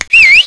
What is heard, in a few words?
WHISTLE; dogz